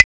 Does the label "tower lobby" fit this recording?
No